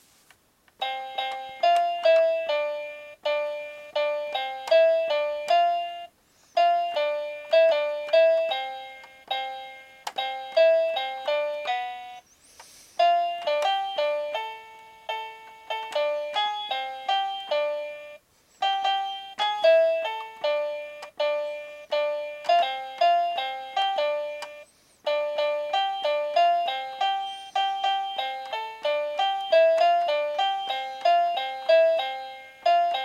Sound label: Pianotrack